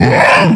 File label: pain; basebuilder; hellgame; cstrike